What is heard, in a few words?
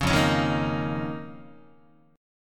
F 7th Suspended 4th Sharp 5th F down Guitar; F7sus4#5; strummed; JGuitar